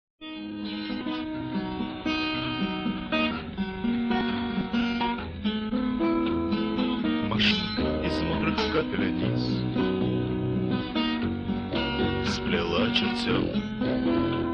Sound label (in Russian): akkordeon